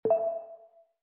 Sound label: Ping